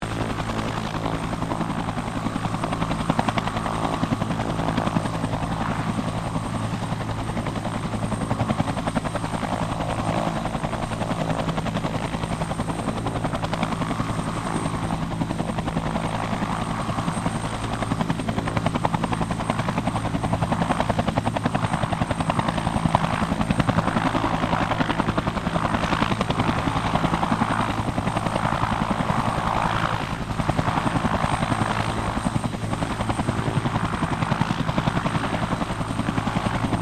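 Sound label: helicopter Bell UH1 Iroquois idle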